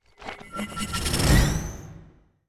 Vigilance; starbites

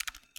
Ui Camera-Sounds

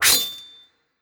Mantis Blade Imp

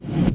Horizontal Dash Prepare

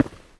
Footstep Rock

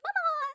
Grub Idle Or Look

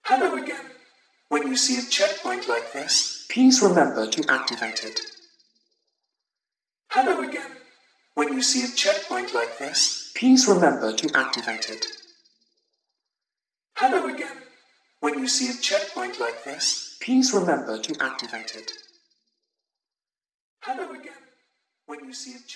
Glitch Construction

